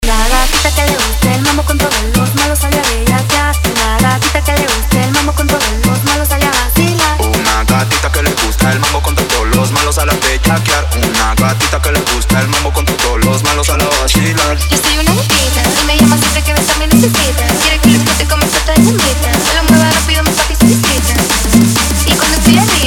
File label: Remix 130 BPM